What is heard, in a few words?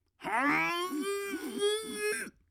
Balloon Pre Burst